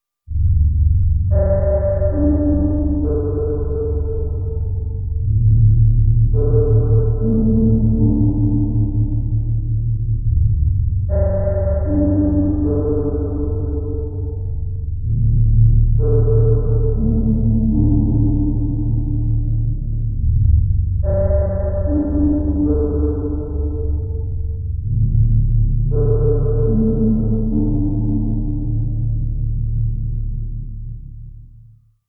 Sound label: Deep Space